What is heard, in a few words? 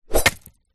sword swipe body hit slash